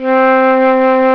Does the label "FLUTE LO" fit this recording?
Yes